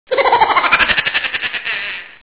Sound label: haha